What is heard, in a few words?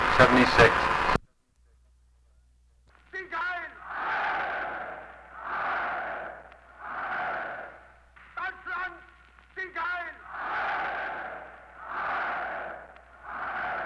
Crowd Chant At Hitler Rally